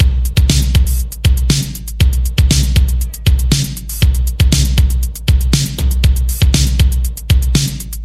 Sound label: Drum loops, Electro